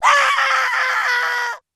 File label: bounce scream